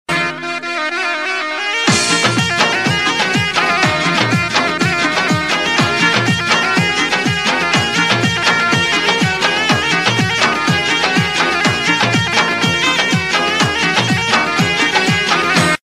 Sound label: irani music ringtone